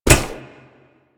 Wall Grab Metal Foley; Warframe; Tenno

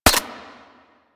Shot Mech; Warframe; Afuris

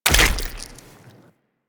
Land Heavy Flesh, Footsteps; Warframe; Tenno

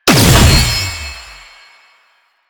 Dash Cast; Warframe; Lasrian; Lasrian Soldiers; Abilities; Butcher

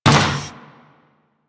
Combined Step Default, Footsteps; Enemies; Warframe

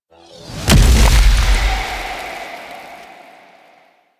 Ice Wave Activate; Enemies; Abilities; Warframe; Corpus; Arachnoid Camper Terra

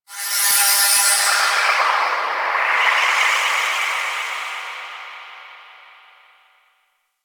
Moon Wind Small, Ambience; Warframe; Orokin Moon

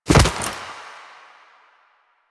Finisher Grab; Warframe; Kuva Lich; Finishers

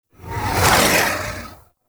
Archon Whip Ranged Attack Projectile; Warframe; The New War; Nira

